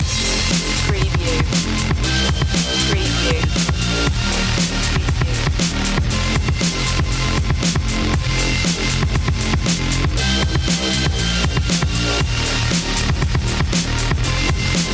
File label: oriental chaos loop